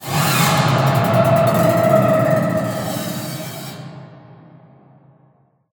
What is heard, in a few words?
Combat Mix Samples